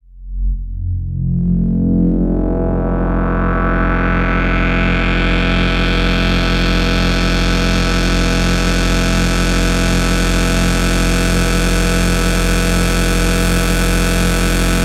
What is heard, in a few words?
cinematic textures and drones